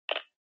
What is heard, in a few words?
Handheld Transceiver Noise Short